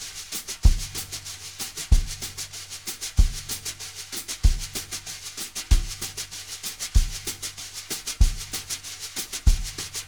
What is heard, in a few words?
BRUSH LOOPS, 95 BRUSHIN